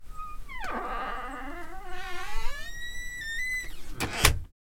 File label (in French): porte